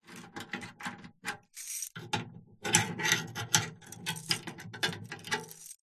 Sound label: foley keys large bunch prison guard inserting single key into metal box lock